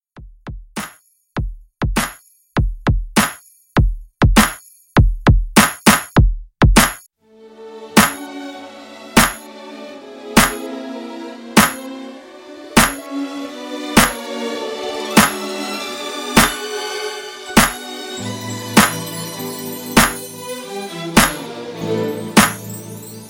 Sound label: Christmas Redrum; Remixfreakz